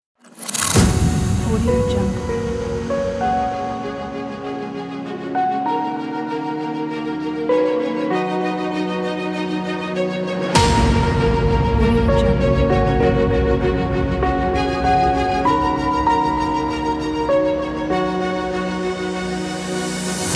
epic cinematic trailer african inspiration

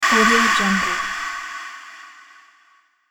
scifi space gas noise

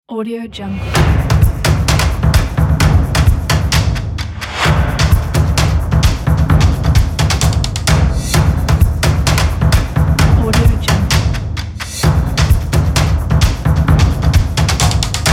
vlog stomp percussion

